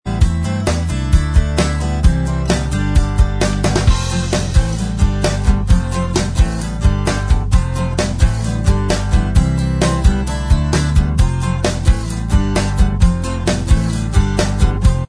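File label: acoustic instrumental, backing tracks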